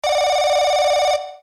phone; maoudamashii

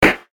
battle; maoudamashii

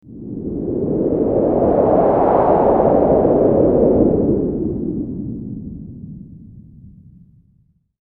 element wind; maoudamashii

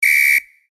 whistle; maoudamashii

